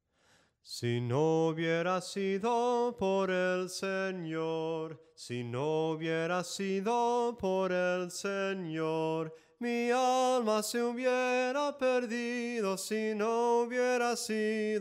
SI NO HUBIERA SIDO POR EL SENOR SOPRANO CANTADO, himnos en melodia soprano; cantos del camino

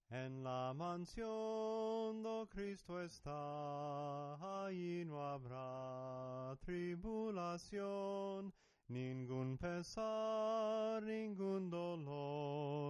SOPRANO CANTADO, himnos en melodia soprano

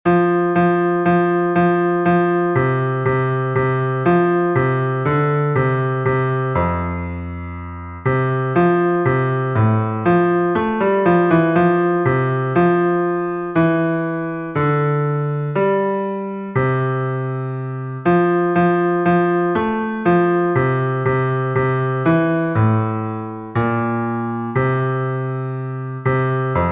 bajo, himnos en piano; 80 LOORES DAD A CRISTO EL REY; cantos del camino

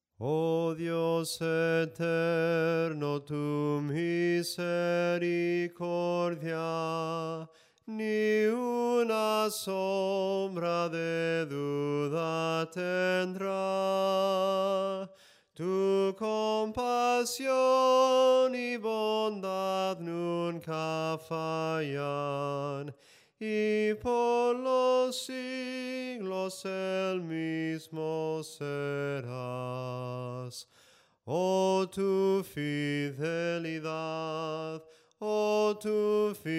SOPRANO CANTADO, himnos en melodia soprano

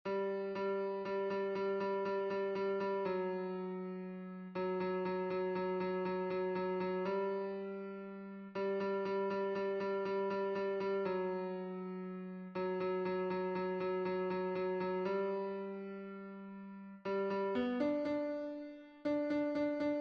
tenor, himnos en piano; 52 DIOS HA HECHO TODO; cantos del camino

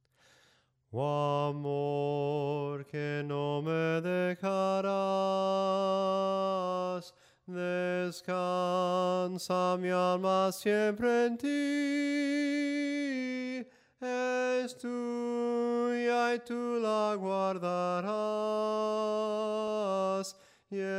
SOPRANO CANTADO, himnos; ¡OH AMOR QUE NO ME DEJARAS

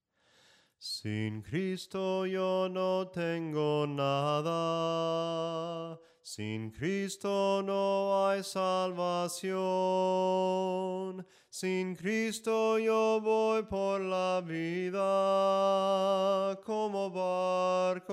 SOPRANO CANTADO, himnos

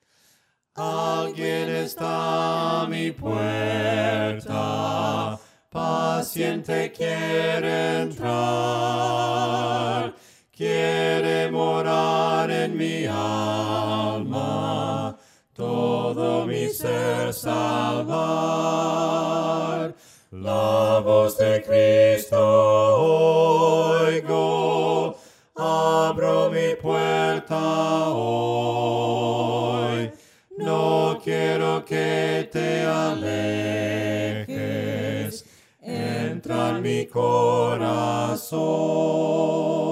VOCES, himnos a capella; cantos del camino; ALGUIEN ESTA A MI PUERTA

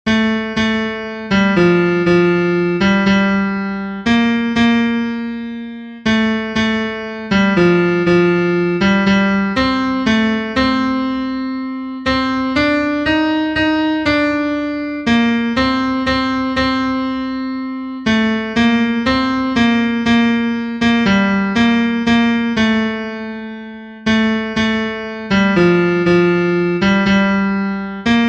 TENOR, himnos en piano; cantos del camino

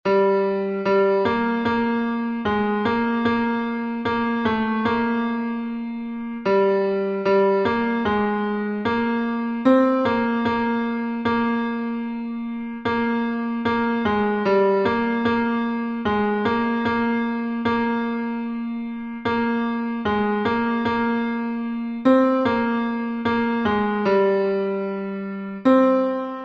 tenor, himnos en piano; 47 CANTAD ALEGRES AL SENOR; cantos del camino

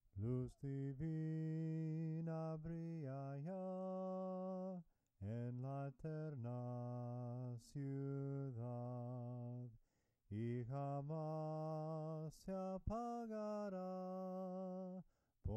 SOPRANO CANTADO; LUZ DIVINA

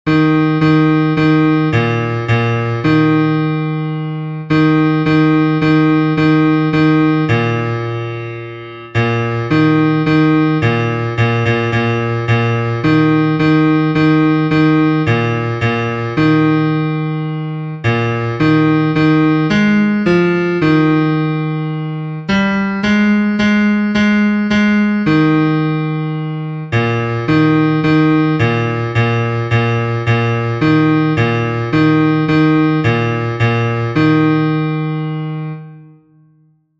BAJO, himnos en piano; cantos del camino

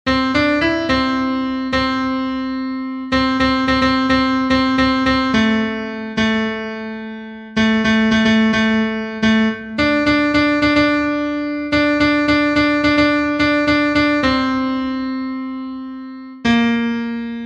CONTRALTO

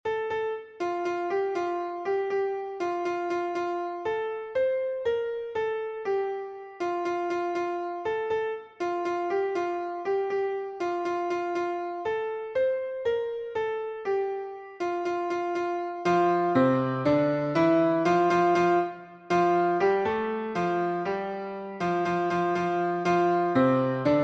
soprano, himnos en piano; 88 CANTEN AMEN AMEN; cantos del camino

